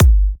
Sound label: G, kicks; markus hakala kicks